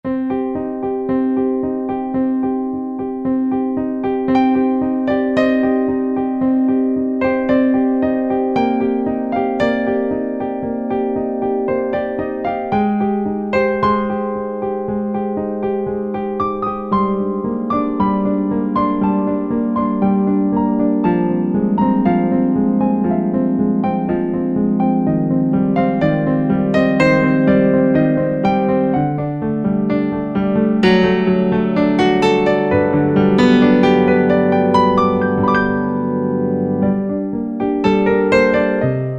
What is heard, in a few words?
Piano, Korg N364; Factory Prog demos